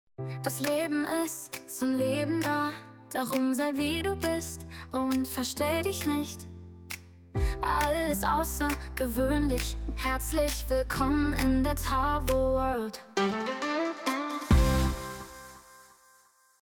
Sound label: Jingle